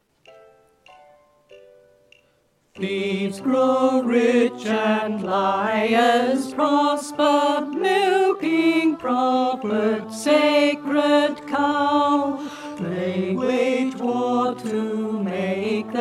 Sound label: alto vocals